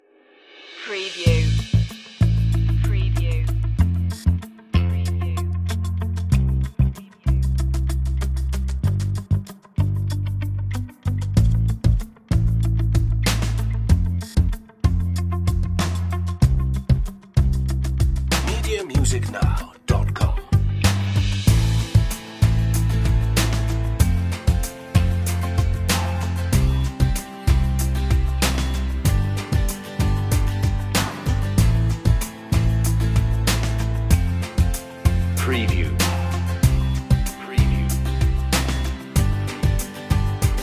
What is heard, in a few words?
drums bass guitar